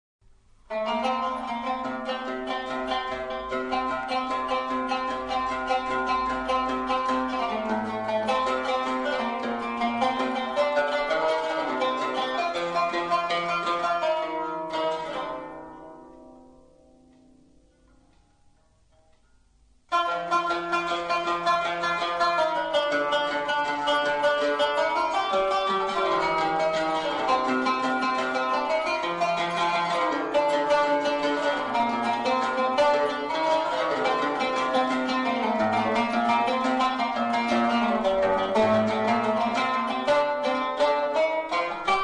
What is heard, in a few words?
Taqsim sikah